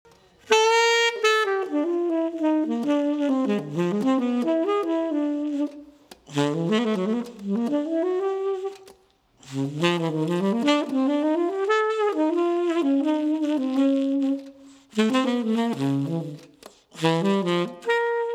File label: Sax; Gottsu